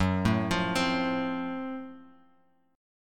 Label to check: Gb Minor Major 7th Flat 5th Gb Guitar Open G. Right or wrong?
Right